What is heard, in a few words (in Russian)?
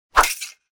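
звук удара в фехтовании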